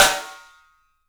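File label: PICOLO SNRS, snare, drums; 3D Sound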